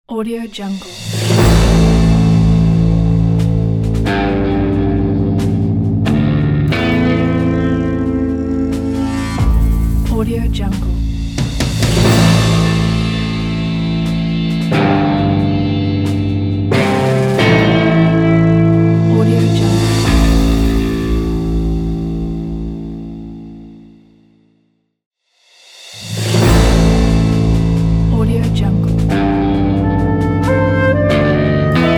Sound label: suspense western ident